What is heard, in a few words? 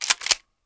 gun cock